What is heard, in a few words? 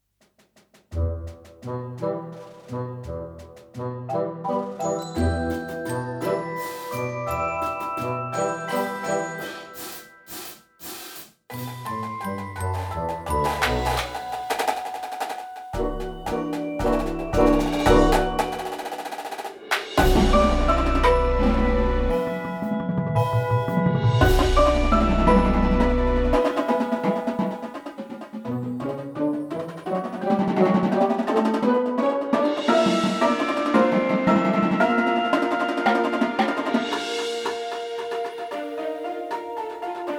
music box